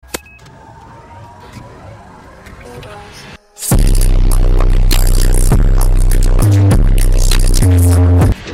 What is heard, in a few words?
alert